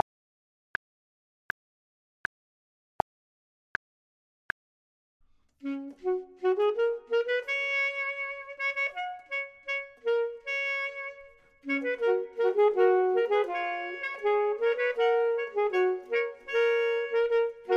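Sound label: both, Saxophone and Clarinet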